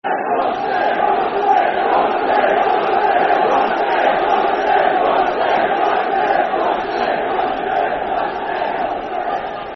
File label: Come On City [Football Chant (Soccer Song)]; Bradford City Bcfc